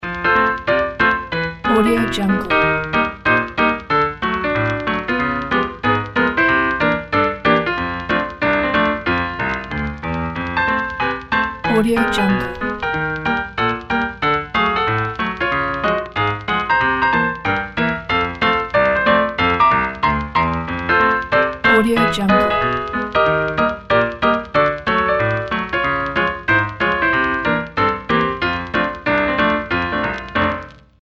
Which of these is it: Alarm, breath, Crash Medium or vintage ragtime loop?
vintage ragtime loop